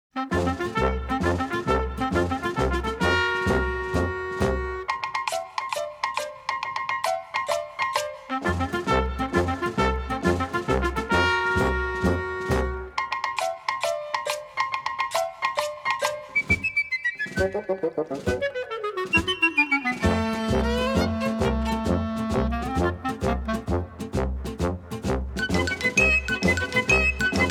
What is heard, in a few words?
comedy cartoon